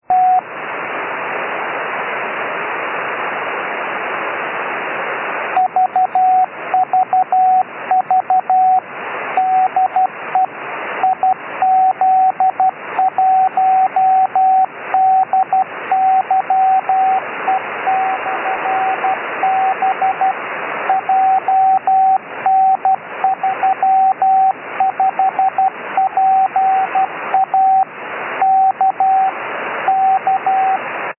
beacon; iz1dye

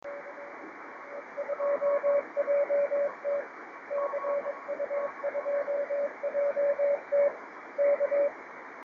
50.084 MHz CW, ham radio; CU2JT HM77 Azores Islands

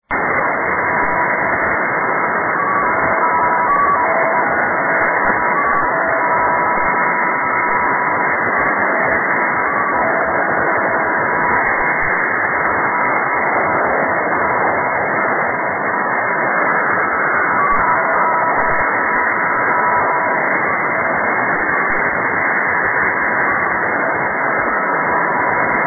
UNID 8705kHz

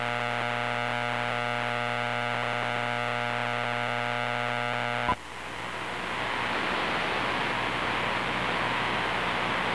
RFI NB; n8xe

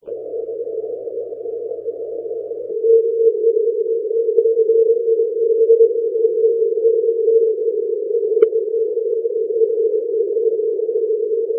80m CW; This recording is the closest I got to 3B9 on 80m !! This fantastic DX-pedition had a great signal on; 3B9C

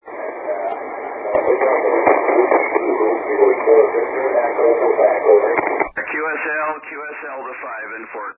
80m cw; I4VEQ

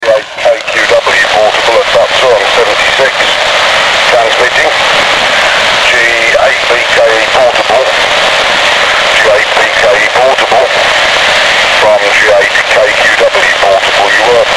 transv FM

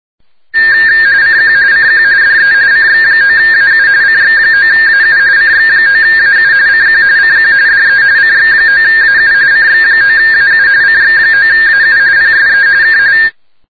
rtty

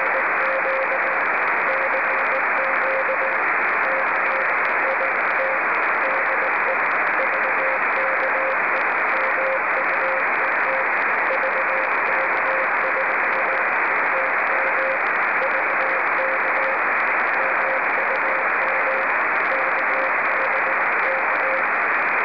CW; Project Moonbounce

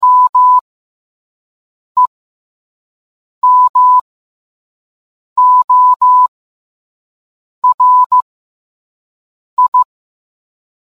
morse

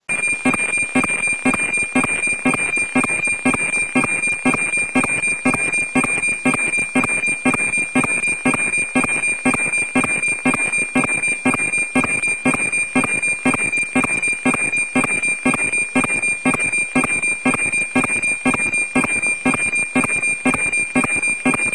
meteor

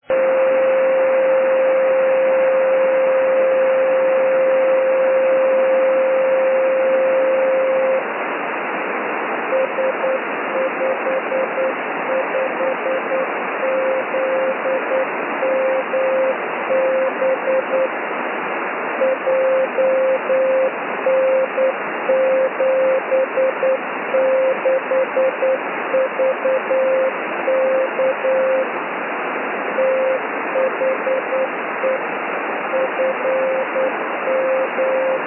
beacon; s55zmb

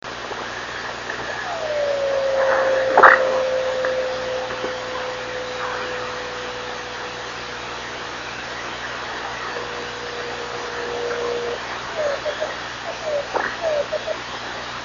26560KHz

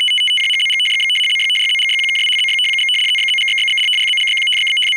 RTTY

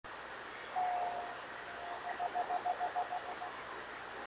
10 dots 26820KHz

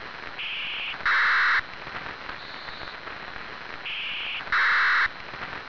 gwen, GWEN : Ground Wave Emergency Network

